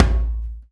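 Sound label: HAND DRUM; Spectrasonics; Heart of Africa 1; HAND DRMS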